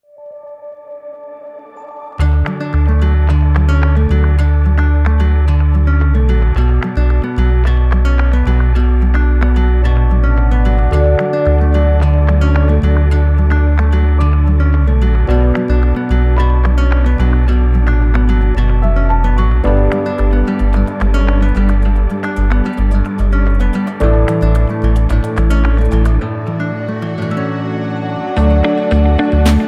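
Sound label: Background soft guitar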